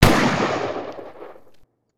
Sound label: Musket, weapons; aronwk collection; ndaudio